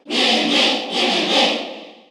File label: Cheer Korean; Mii; SSBU